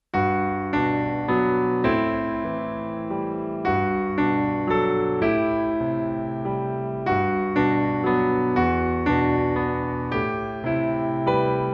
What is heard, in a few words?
Meditation